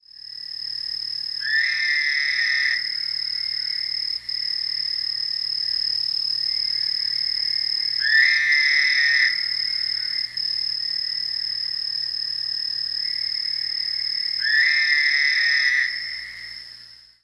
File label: narrow mouthed toad